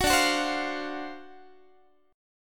D 7th Sharp 9th D down Guitar; D7#9 strummed; 21,22,21,22,21,x; tuning 4A,4E,5A,5D,5G#,6C#

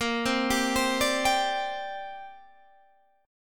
D 7th Suspended 4th Sharp 5th, chord

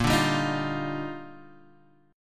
D sharp Major 9th A sharp

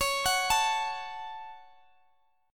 Db Suspended 4th Sharp 5th, Guitar, Open D

